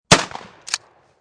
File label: 12 Gauge Bridge; 870; War; VRSonic